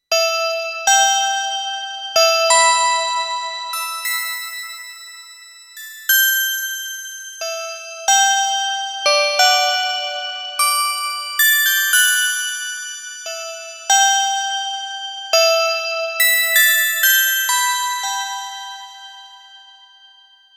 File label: Chime Keys; Kawai K1; Bank 2 card A